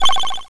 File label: bleep, computers